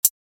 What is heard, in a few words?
Hi Hats Samples trance